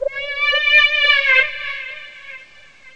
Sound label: synth yowl